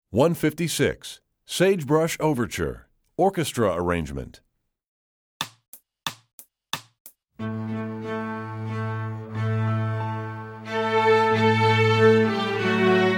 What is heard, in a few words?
Sagebrush Overture Orch Arr, Strings; EE2000 Book2